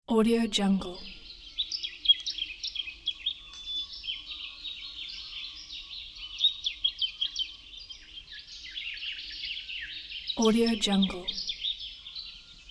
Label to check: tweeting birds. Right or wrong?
Right